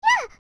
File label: Grab; Ruto